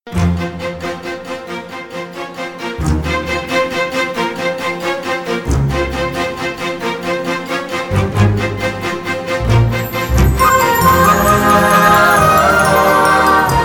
Intro BGM; Thaamirabarani; Banu; Tamil

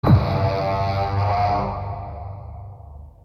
horn; Black Panther

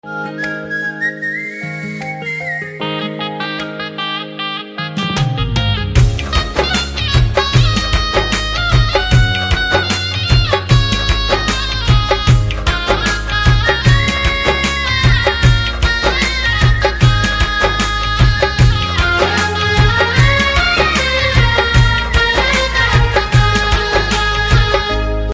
BGM Ringtone; Dabangg 3

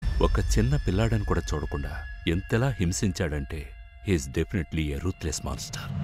Trailer BGM Ringtone; Telugu

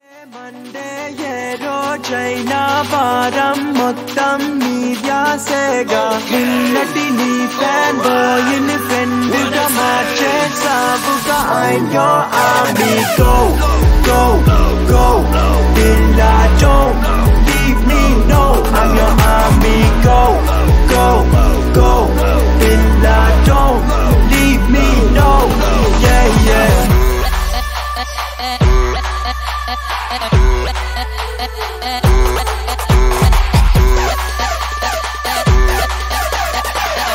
Bgm Ringtone; A1 Express; Telugu

